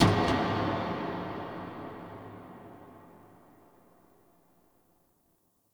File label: Creepy Metal Swell Clank; Warframe; Entrati; Dante Unbound Leverian